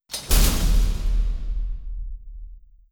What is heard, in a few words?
gate slam